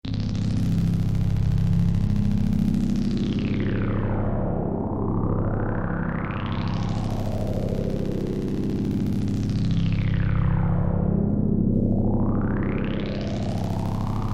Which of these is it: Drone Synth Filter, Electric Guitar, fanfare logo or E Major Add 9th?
Drone Synth Filter